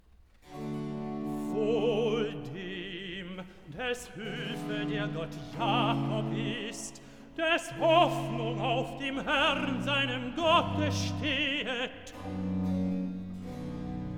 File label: Recitative (Tenor); Bach; Cantatas; BWV143; Lobe den Herrn, meine Seele; Wohl dem des Hulfe der Gott Jakob ist